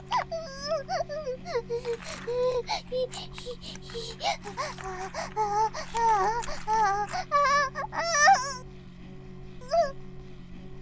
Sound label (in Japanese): バイブ放置; イクたびにわけて